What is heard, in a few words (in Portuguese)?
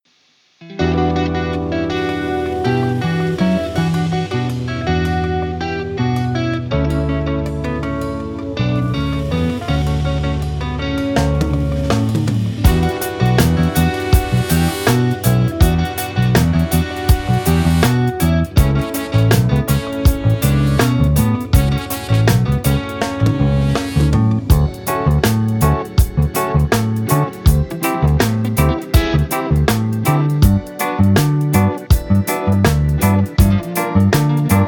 Versao Reggae